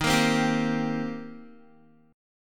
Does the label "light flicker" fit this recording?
No